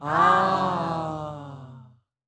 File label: audience impressed